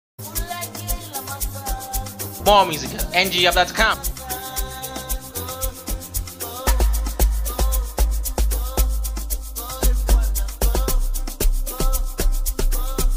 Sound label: Amapiano